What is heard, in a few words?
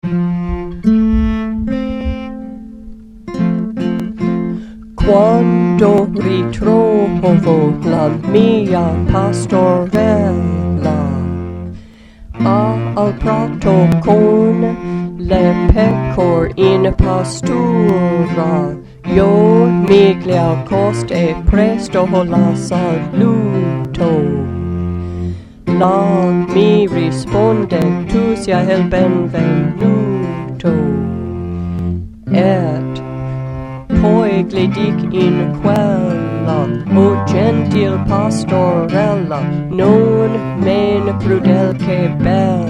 Bass Wds; Quando ritrovo